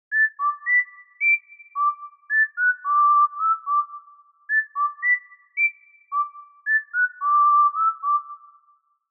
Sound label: Roland SC-88 Whistle; Tomodachi Life